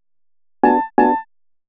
HOUSE EXTRA, 125

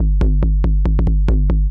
KICK TECHNO 140, Techno Drum; Techno2

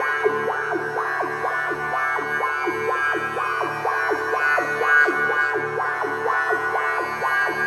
ethno, 125, A

